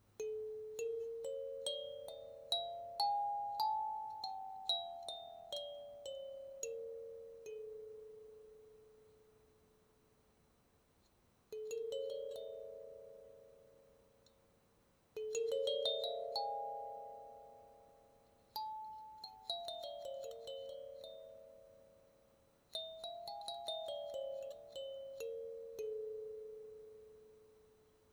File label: arabesque, kalimba; koloroj